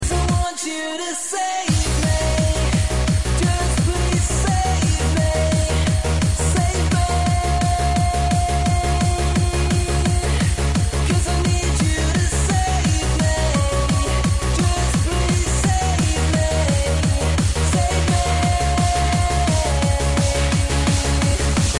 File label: club ringtone; Funny